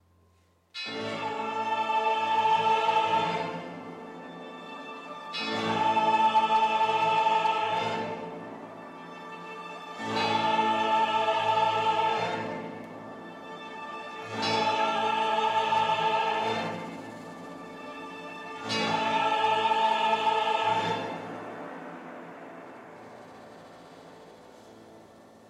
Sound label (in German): joy chor